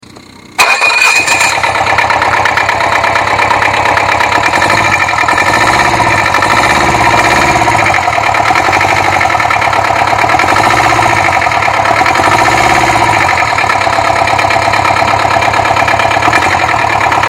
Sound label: jawa auto